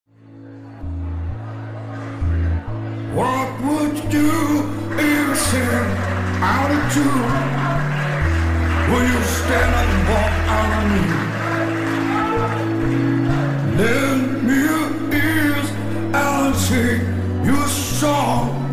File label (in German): Partyband